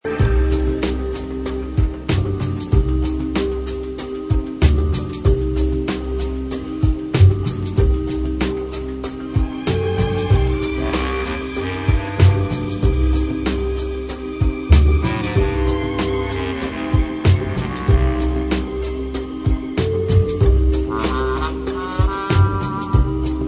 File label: loop; Danger Mouse - Ultimate